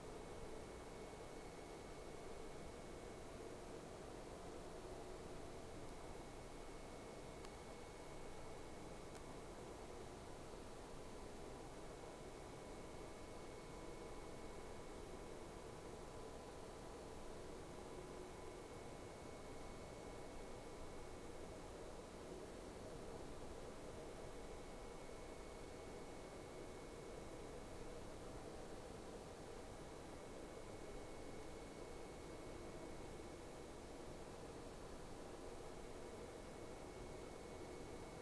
Whales; SeaSnake

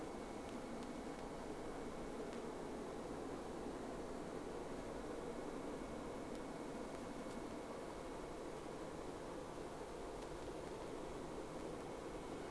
Whales; SeaSnake

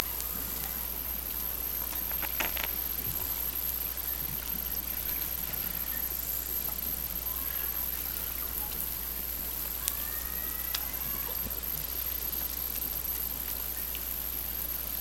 Hydrophones, orcasound

